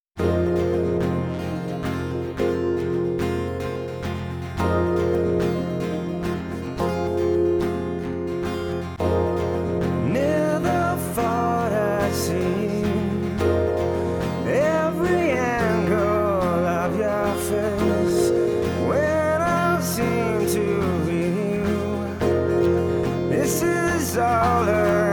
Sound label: acoustic